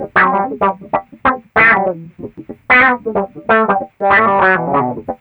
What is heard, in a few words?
GUITAR; East West; Funky Ass Loops